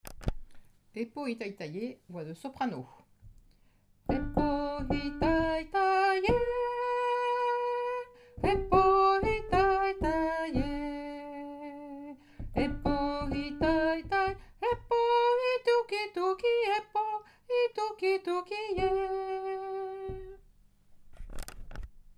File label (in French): soprano, choeur